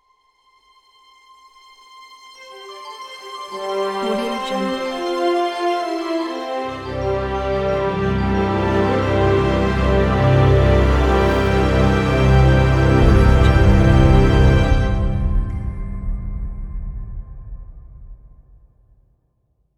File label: cinematic orchestral logo reveal